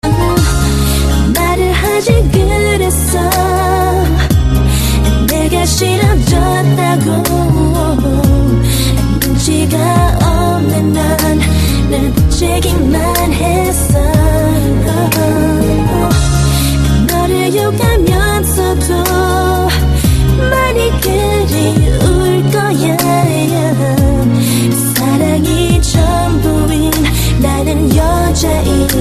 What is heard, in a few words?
Japanese Song